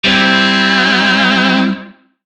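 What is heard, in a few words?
Shawzin Pentatonic Major Chord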